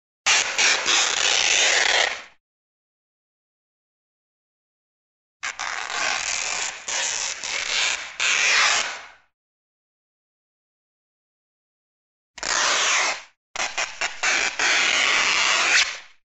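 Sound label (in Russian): razriv tkani tkan rvut, fabric tearing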